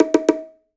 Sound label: perc bongo run; Drum One Shots; SD MIDNIGHT Sample Pack; Slate